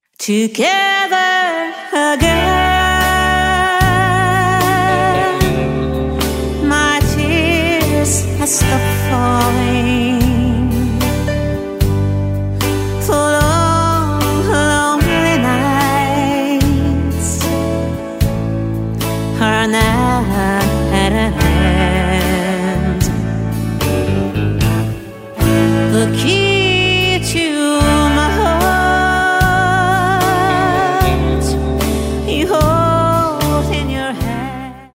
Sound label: country parlor